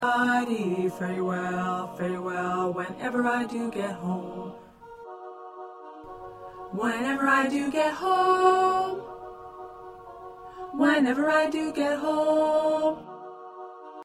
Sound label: Tenor 1